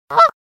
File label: goose